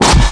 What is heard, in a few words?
MINELAY; Forsaken